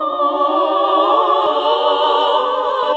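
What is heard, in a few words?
Classical Sing, choir; Best Service Gigapack 1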